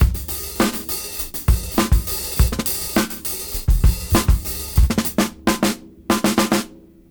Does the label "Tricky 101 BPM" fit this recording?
Yes